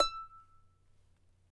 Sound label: charango E5; Spectrasonics; Hans Zimmer Guitars VOL-2